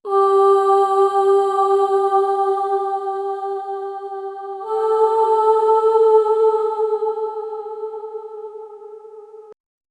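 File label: angelic female chorus sing; choir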